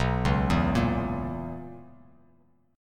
B Major 7th Suspended 2nd Suspended 4th B Bass Standard; arpeggiated; 7,4,2,3; JGuitar